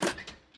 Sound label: power switch activate; factorio 2.0.55